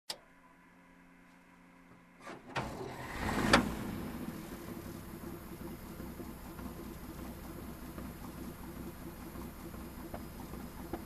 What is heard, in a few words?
Driving in tank